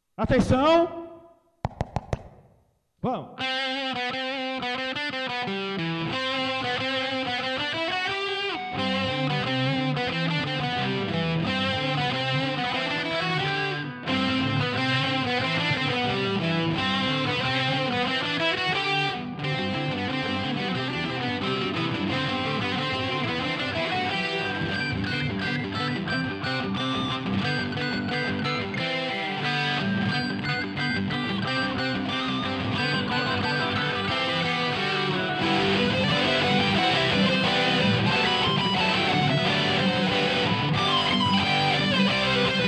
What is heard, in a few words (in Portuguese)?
cavalgada fuga em g maior